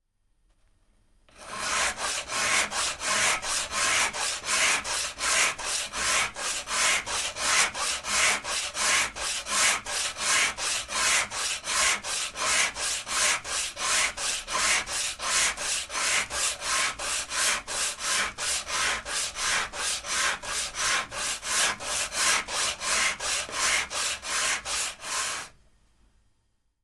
carpenter tool sandpaper sanding strong pressure xy; sonniss gdc